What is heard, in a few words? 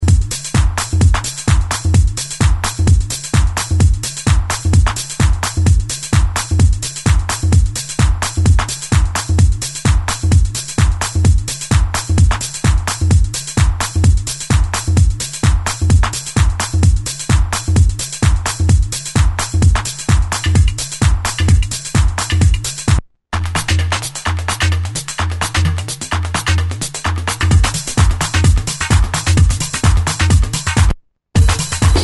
Retroactive Funk